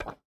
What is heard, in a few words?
stone step, footsteps